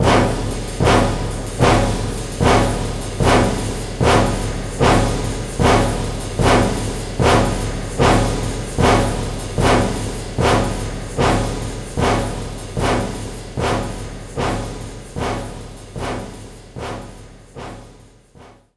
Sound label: Spooky